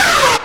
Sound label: Hardstyle Screech